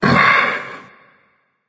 nv grunt lift; cernunnos

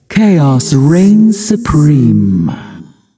"yay"; smite; voicepack; nuwa

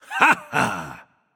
"yay"; Smite; bacchus

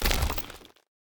defeat pillars move; smite; god ganesha skin11

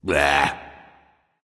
ugh; smite; voicepack; vulcan

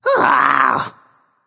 nv ugh; smite; voicepack; ganesha

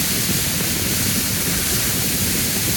burn loop; smite; god daji skin15

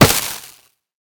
hit; smite; god raijin

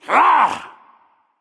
grunt attack; smite; thor

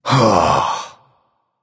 sigh; smite; susano

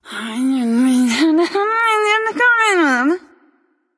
grumble light; smite; voicepack; hel

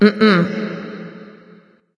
disagree light; smite; voicepack; hel

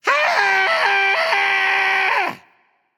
battle cry; fafnir

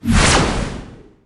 basic fire; smite; chronos

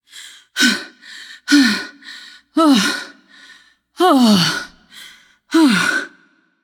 nv breath; smite; voicepack; morgan le fay

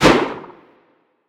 fire; smite; scylla

